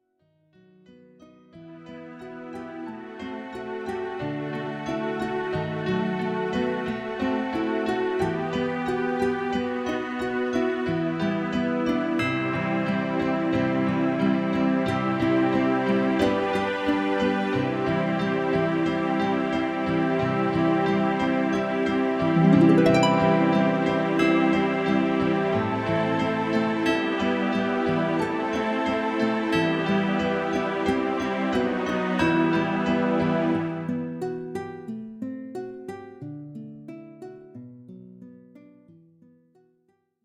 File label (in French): instrumental